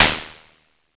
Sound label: ANA WN PERC, ANALOG PERC; Zero-G Ambient 1